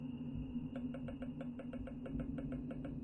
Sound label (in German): gehen gross; Machinarium; gamesoundopedia